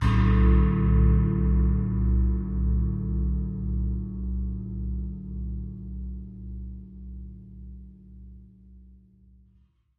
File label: China 16 Cymbal Medium Tap; China 16 Cymbol Soft Tap